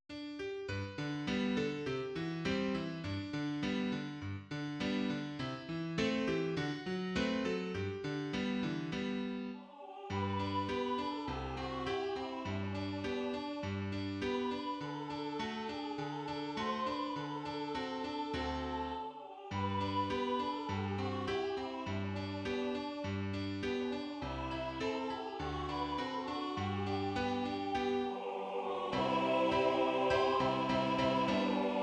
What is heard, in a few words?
Aloha oe song midi